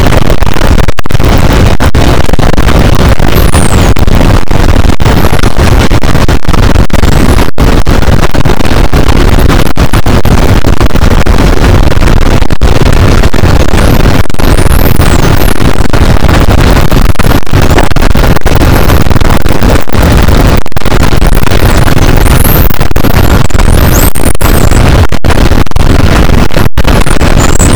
cachalot negatif, boat, Physeter Macrocephalus

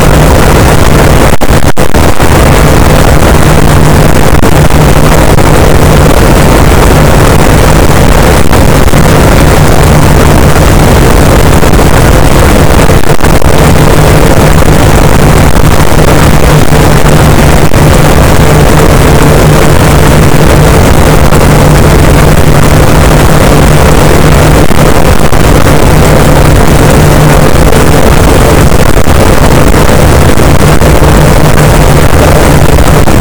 cachalot negatif, boat, Physeter Macrocephalus

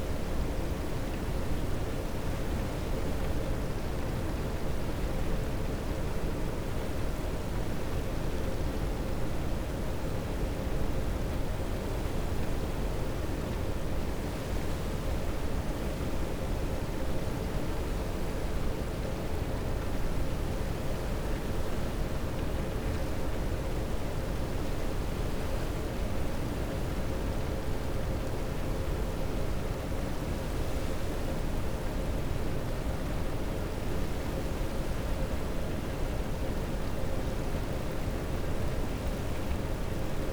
cachalot negatif, Physeter Macrocephalus

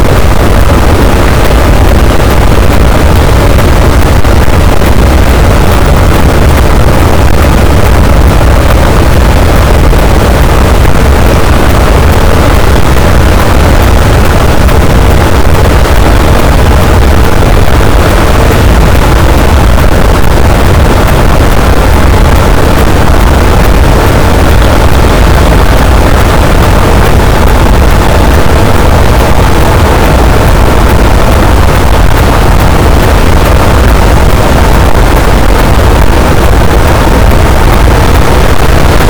cachalot, boat; Physeter Macrocephalus

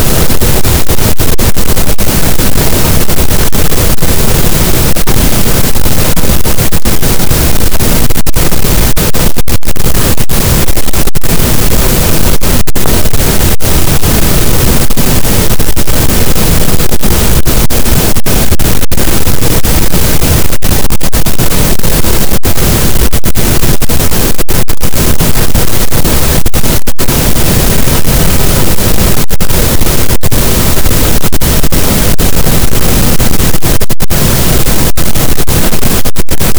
cachalot, Physeter Macrocephalus, gaussian noise

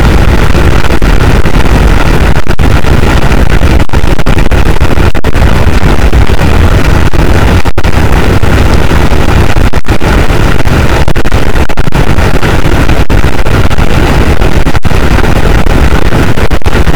cachalot, boat; Physeter Macrocephalus

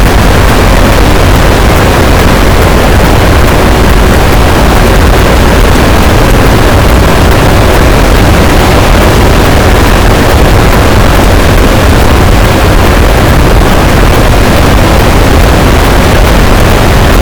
cachalot positif, boat, Physeter Macrocephalus

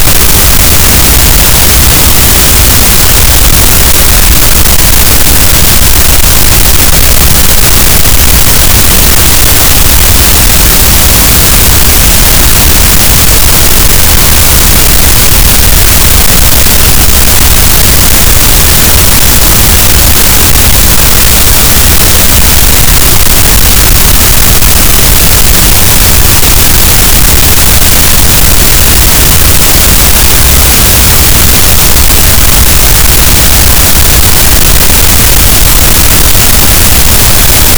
cachalot, gaussian noise; Physeter Macrocephalus

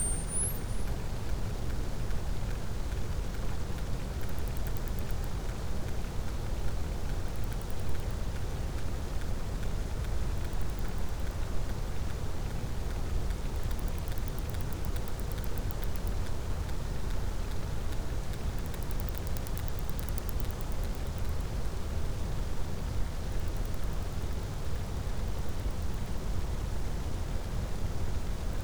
cachalot positif, Physeter Macrocephalus

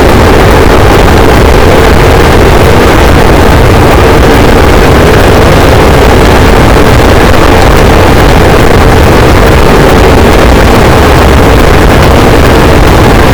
cachalot negatif, boat, Physeter Macrocephalus

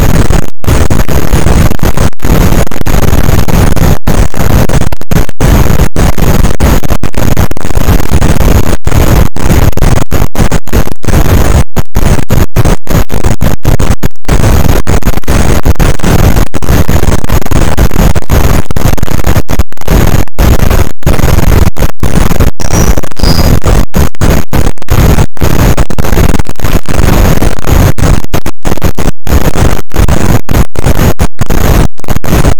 cachalot positif, boat; Physeter Macrocephalus

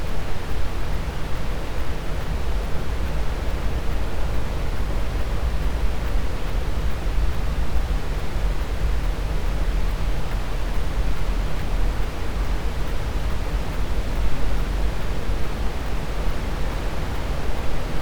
cachalot negatif, Physeter Macrocephalus

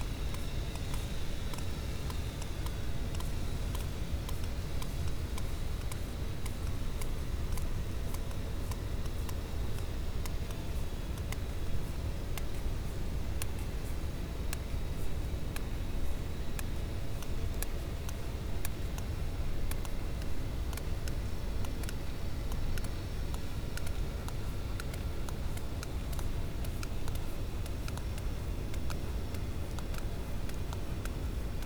cachalot positif, Physeter Macrocephalus

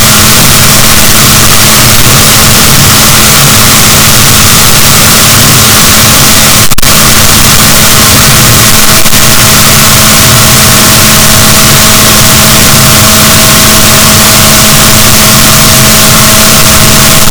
rorquals negatif, Balaenoptera Physalus

